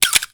camera snap; soundmorph

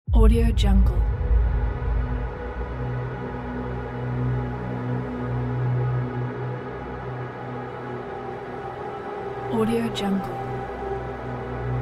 drone space; archisound

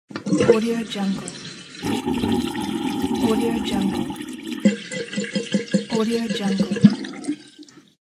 sink draining

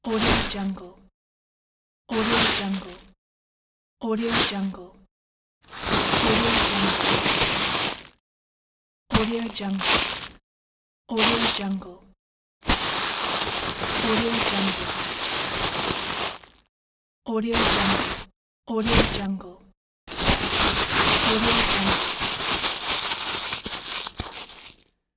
plastic bags noises

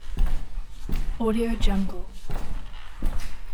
step; albasfx

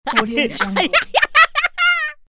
boy joke laughter

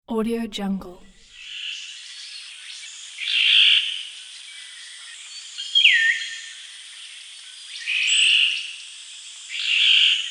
jungle birds

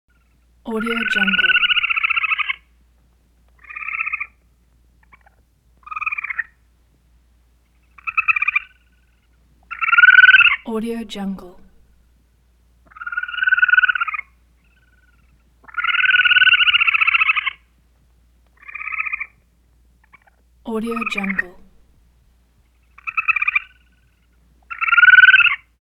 chipmunk chatter

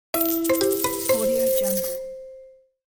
money win

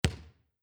basketball single bounce

